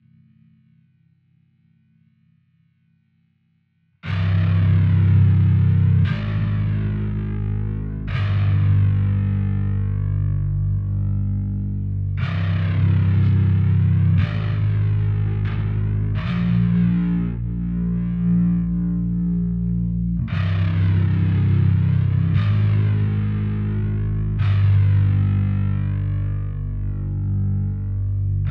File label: bass; spinebashed; Bridge; 118bpm